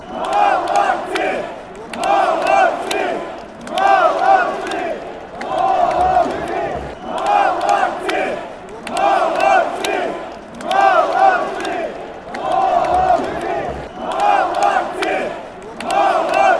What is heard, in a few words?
ukraine chant